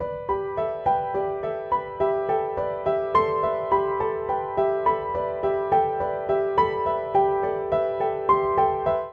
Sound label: Ostinato Wonderment Gmaj Amaj 105 bpm, Upright Piano; Upright Piano by Spitfire Audio; Ableton; Factory Packs